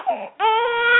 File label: crying, baby cry